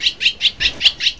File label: cat